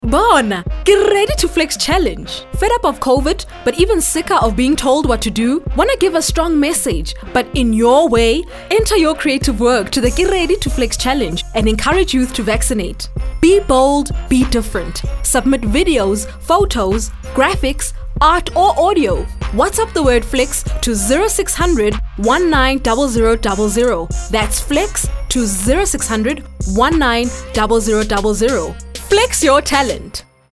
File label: music